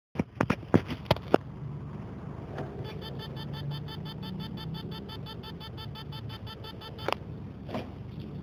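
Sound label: Stiction samsung 2,5 external, damaged hard drive sounds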